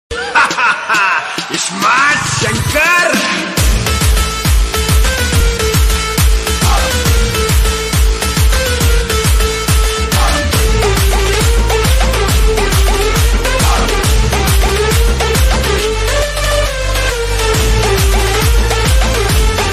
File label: attitude bgm; ismart shankar bgm